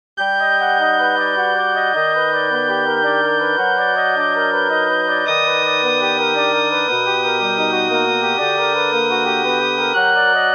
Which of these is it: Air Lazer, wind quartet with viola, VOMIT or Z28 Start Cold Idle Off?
wind quartet with viola